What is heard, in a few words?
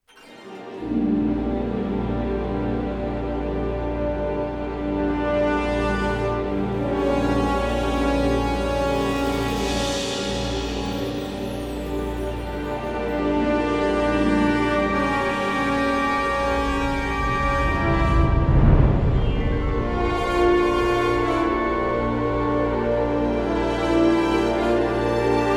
witch music